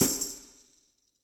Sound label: Tambourine Dark Plate Verb; Drum Hits; Standard Hits; Ableton; Session Drums Club